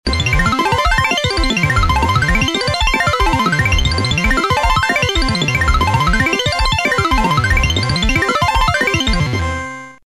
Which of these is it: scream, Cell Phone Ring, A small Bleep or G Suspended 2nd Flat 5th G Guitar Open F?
A small Bleep